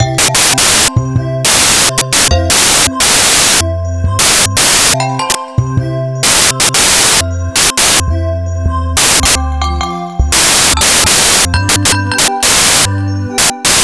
fantasy, bgm; pictcode

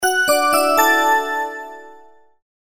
CHIME; pictcode

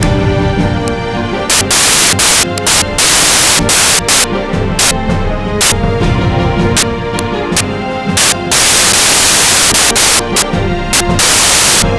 orchestra, bgm; pictcode

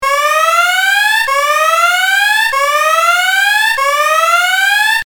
SIREN; pictcode

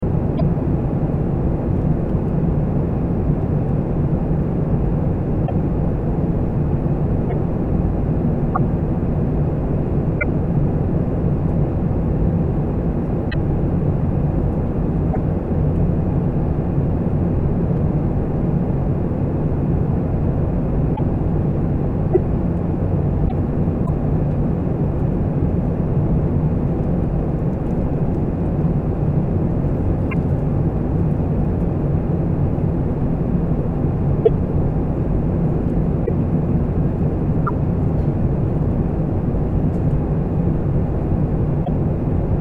AIRPLANE; pictcode

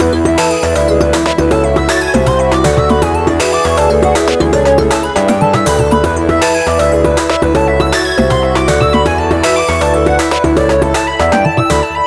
pop, bgm; pictcode

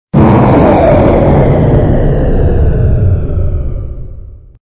ATTACK; pictcode

